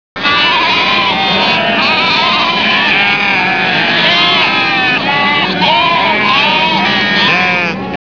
ziegenherde; geraeusche tiere

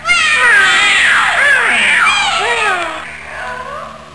katzen viele; geraeusche tiere

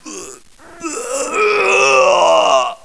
wuerg; geraeusche menschl

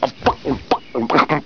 huhn; geraeusche tiere

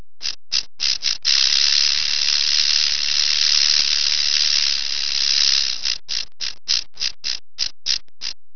klapperschlange; geraeusche tiere

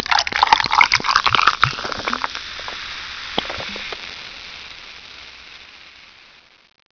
sprudel glas

